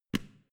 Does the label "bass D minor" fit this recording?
No